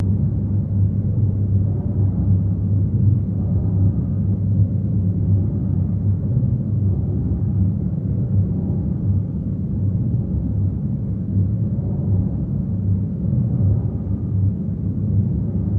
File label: Bird Prey Industria, spaceship fx